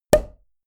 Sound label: pop